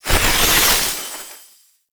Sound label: Floating Pages Activated Start; Warframe; Focus; Ultimate Abilities; Zenurik